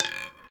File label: center Roll Short, roulette; europeanroulette3